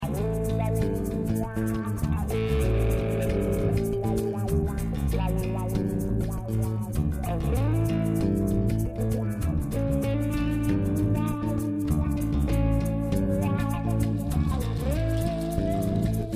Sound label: Sweet Emo Chorus